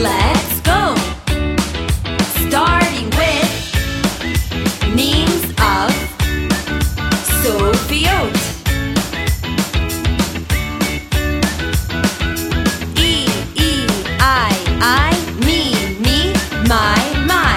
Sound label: Chant